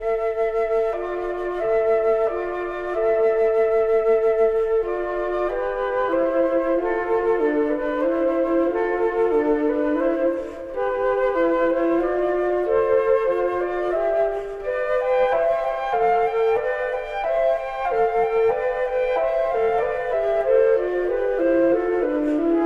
Slovak Radio Symphony Orchestra, Berceuse from the opera; Jocelyn